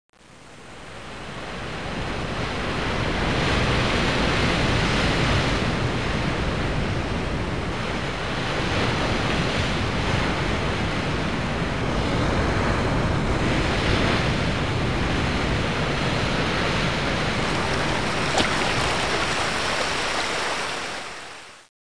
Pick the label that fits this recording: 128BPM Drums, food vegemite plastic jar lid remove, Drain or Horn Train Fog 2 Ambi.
Drain